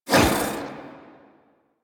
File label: Archon Whip Spin Whoosh; Warframe; The New War; Nira